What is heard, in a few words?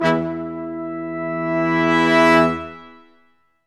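brass quintet sfz; Roland L-CDX-03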